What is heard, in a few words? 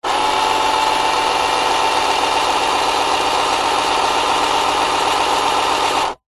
science fiction robot small tracks wheels motor whirr spin